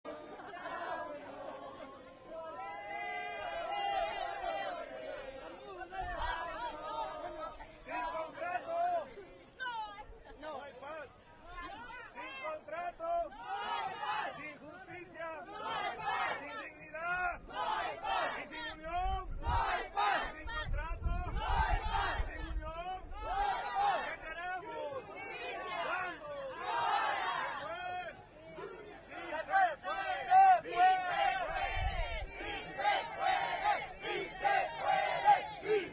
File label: chants